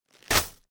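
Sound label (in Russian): мешочек с монетами бросают на стол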